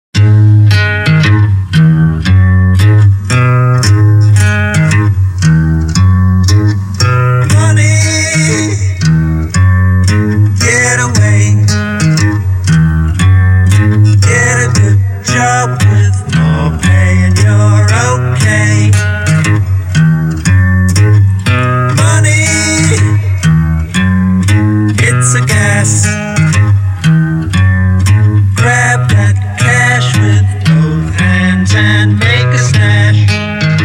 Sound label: Acoustic